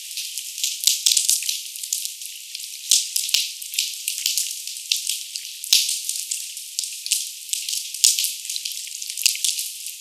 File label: Redfish snap